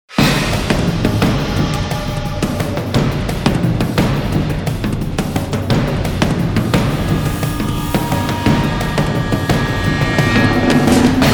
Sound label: scale mix